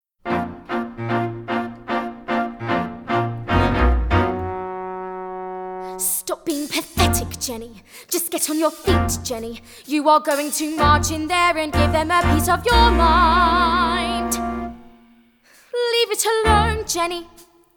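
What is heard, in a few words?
with Vocals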